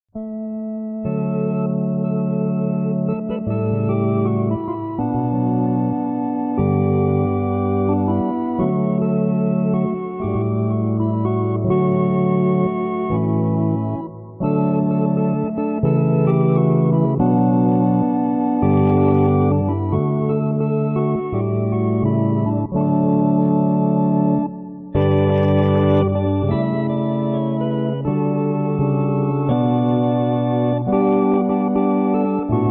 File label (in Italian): solo musica; preghiera